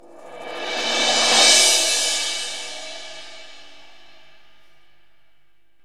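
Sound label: reverse sweep; AMG; Now CD-ROM (Roland); NOW! FX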